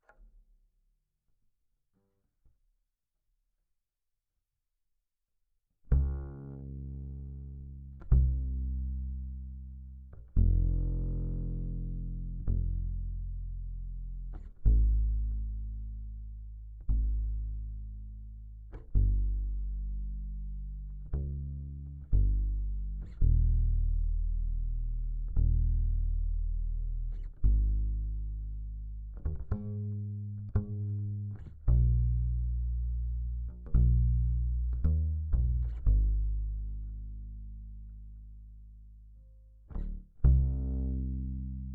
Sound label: Bass DI